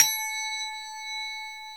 soft hit normal; osu

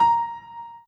Piano Chord

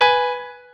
piano

